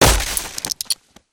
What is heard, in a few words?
hazmat smashable, destructibles